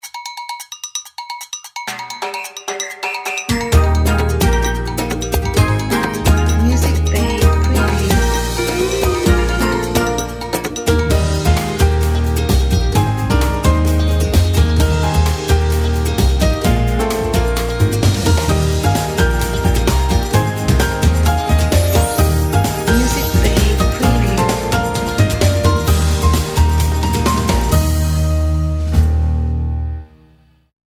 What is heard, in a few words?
Rio Carnival